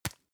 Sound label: tissue tap